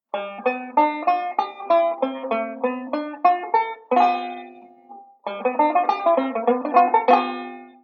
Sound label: banjo; beyond chord melody volume ii